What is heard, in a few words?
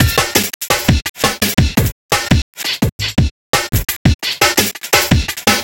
DJ Beat, Drum N Bass; Sonic Foundry (Sony Creative Software); Knutrix Drum & Bass